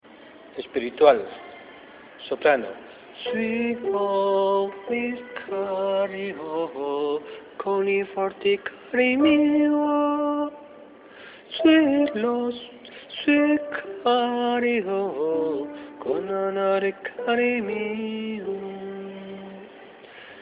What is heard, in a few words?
sopranos espiritual